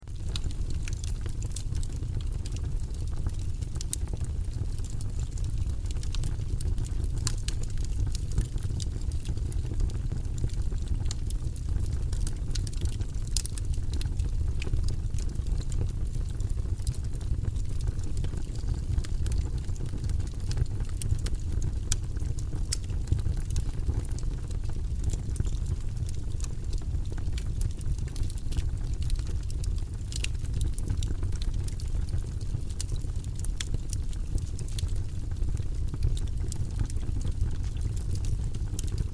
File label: Campfire burning with a quiet ripple